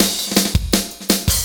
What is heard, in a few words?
Fill, Drum N Bass Percussion Fills n Breaks